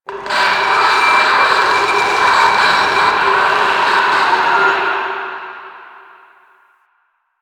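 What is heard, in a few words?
Infested Distant; Warframe; NineteenNinetyNine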